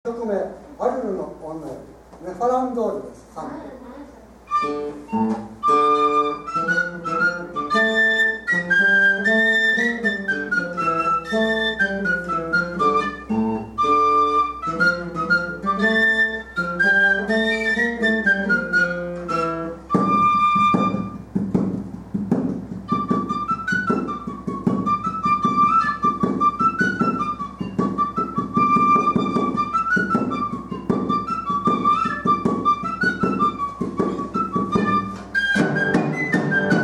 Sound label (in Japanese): 3孔しかない縦笛のガルベ; スペインから南フランス、それにブリトン（ＵＫ）に残る3孔しかない縦笛のガルベ。左手だけで演奏できます。; 小学校・音楽鑑賞コンサート; 貝塚市葛城小学校